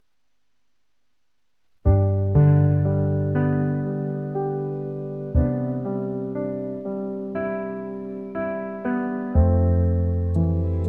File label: rain piano